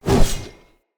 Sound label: Short Sword Whoosh Heavy; Weapons; Enemy; Swords; Short Sword Elite